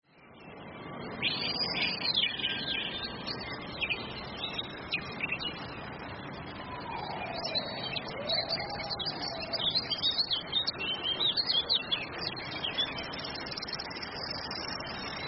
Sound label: nature